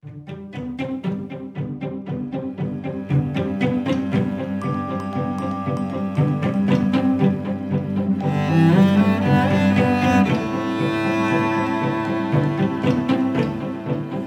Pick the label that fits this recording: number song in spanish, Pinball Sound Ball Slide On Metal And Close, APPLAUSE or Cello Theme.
Cello Theme